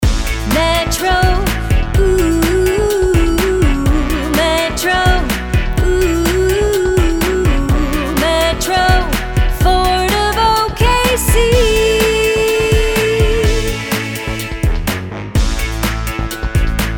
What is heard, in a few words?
Jingle; Metro Ford of OKC